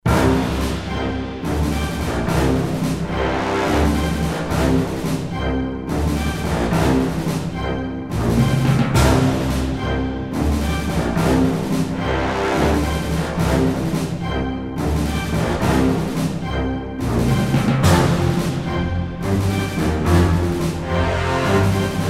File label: Confident; bridgecommander